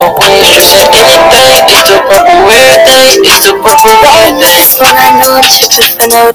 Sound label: song bass boosted